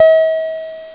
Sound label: Time Out; BoulderDдsh; ClassicC64